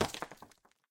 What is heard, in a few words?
Rock Impact Soft; Puddle; puddle plus ios gamerip 2018